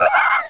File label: screec; alienbreed3d2